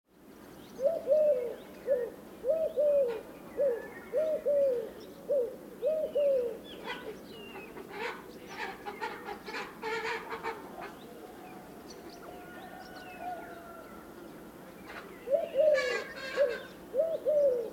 Turkse Tortel Streptopelia decaocto

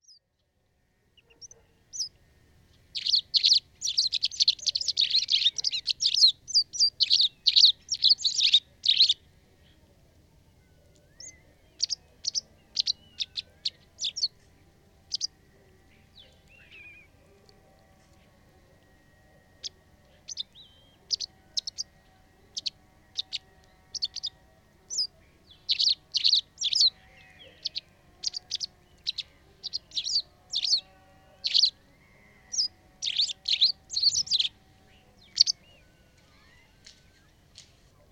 Witte Kwikstaart Motacilla alba alba

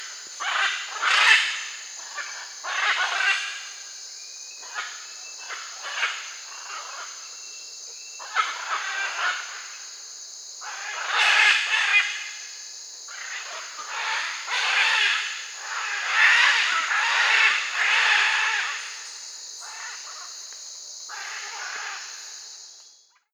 Blauw gele Ara Ara ararauna

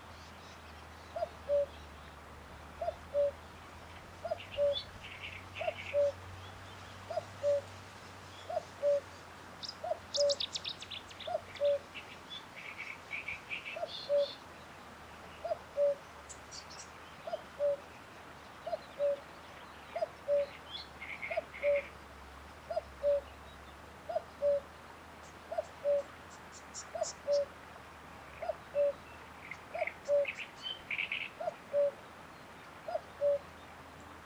Koekoek Cuculus canorus

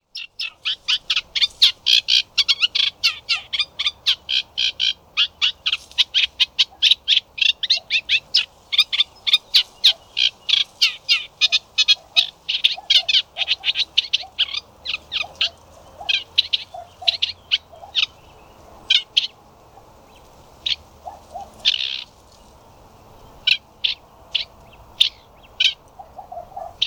Kleine Karekiet Acrocephalus scirpaceus

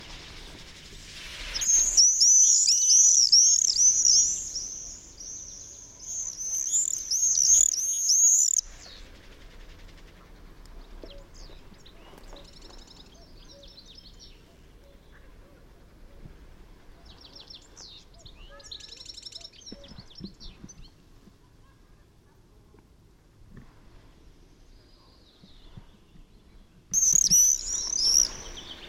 Gierzwaluw Apus apus

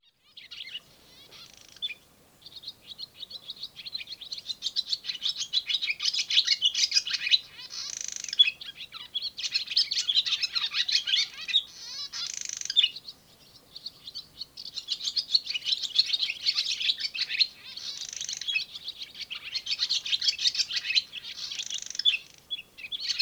Boerenzwaluw Hirundo rustica

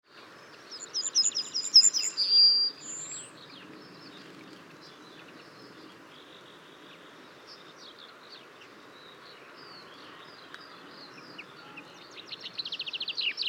Geelgors Emberiza citrinella citrinella

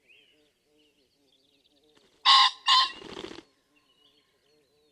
Fazant Phasianus colchicus

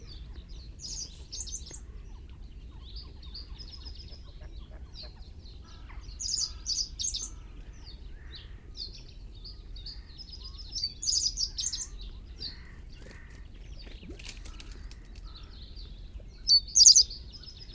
Rietgors Emberiza schoeniclus

